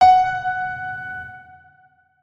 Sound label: notes, keyboard piano; Hideout Furniture